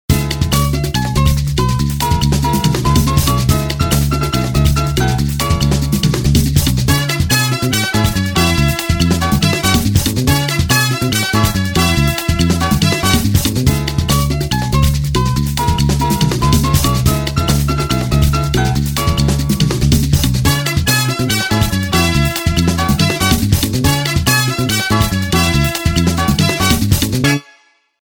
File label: Latin, ringtones; K-Touch; Nibiru-H1